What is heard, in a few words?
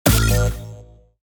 Casual mobile game menu ui error